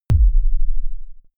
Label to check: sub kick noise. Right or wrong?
Right